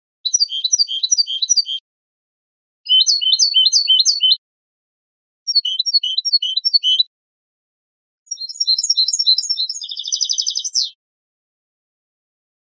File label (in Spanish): penalizaciones mecida canto limpio, campero